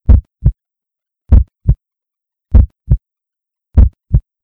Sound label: heartbeat